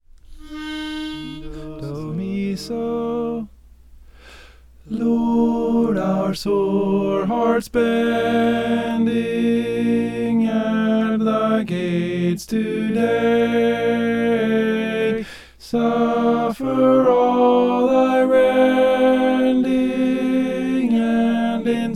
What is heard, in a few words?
tenor